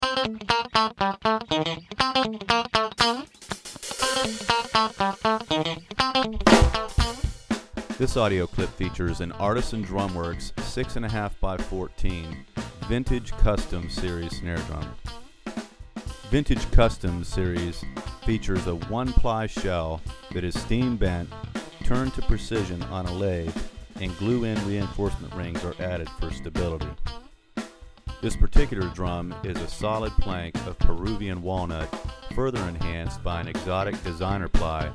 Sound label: walnut burl jingle, drum